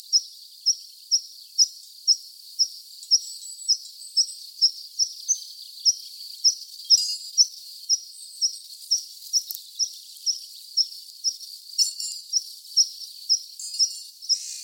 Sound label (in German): Baumpieper; Kranzegg OA; Kilian Weixler